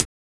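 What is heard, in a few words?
HH, hi-hat; X-Static Goldmine 1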